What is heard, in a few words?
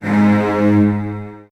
G#1, Virtuoso Strings, 16TH; ILIO; Kirk Hunter; CL 16TH